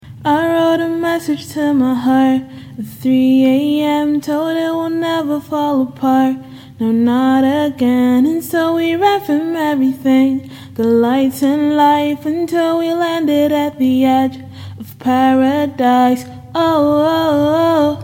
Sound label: Acapellas, pop 120 G; Edge Of Paradise